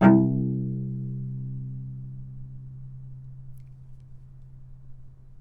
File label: C2 - Sforzando, novice cello